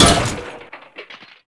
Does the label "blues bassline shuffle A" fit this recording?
No